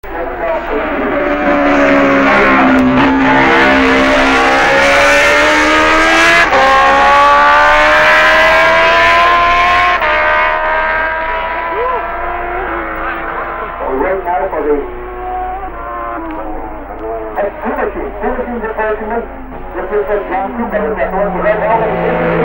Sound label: MV Honda 4